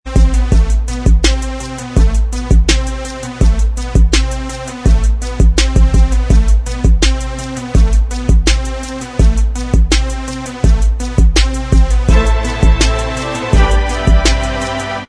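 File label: instrumental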